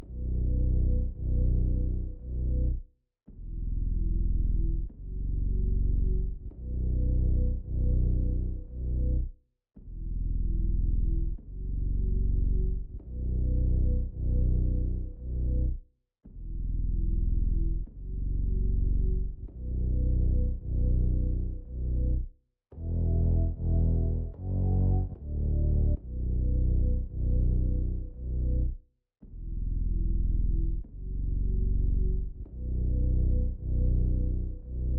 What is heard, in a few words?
148 BPM B Min Bass; Loop Stems; Cymatics; Veil